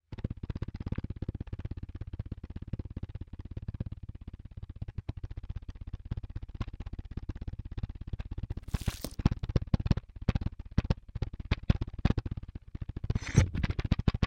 An Engine Failure; 384 Sound Designs

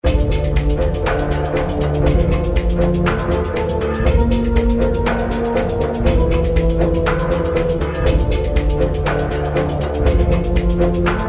Music background pads

